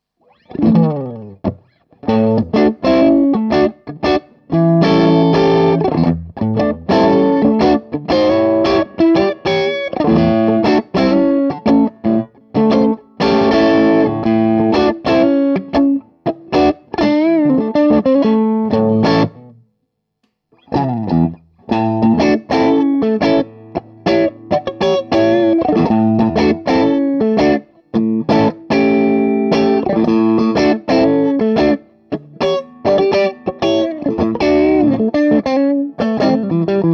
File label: Crunch Single Coil